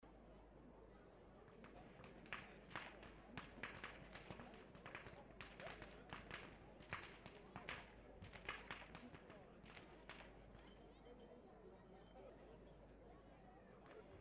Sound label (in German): schnalzen, jugend